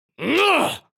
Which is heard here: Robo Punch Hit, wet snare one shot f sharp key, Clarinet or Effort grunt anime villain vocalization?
Effort grunt anime villain vocalization